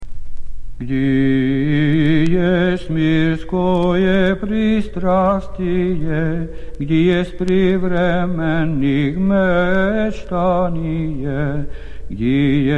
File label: Funeral Hymn Tone 4; Papp Irmologion; Bolhar